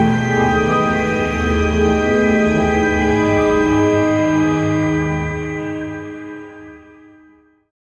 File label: ambient thai fusion soft; world